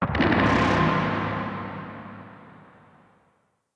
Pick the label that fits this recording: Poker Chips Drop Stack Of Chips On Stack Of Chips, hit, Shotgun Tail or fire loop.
Shotgun Tail